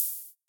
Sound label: ride cymbal drum sample G key